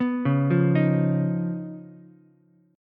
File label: Db 9th Db down Ukulele C6; arpeggiated; JGuitar